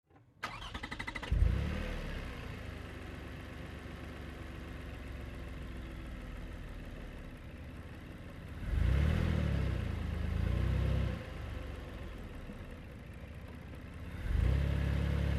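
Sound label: Car Highlander Onboard 3 Point Slow; 2005 Toyota Highlander V6; Vehicles; Cars; 3 Point Turns; Big Room Sound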